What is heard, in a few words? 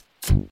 Tech SWISH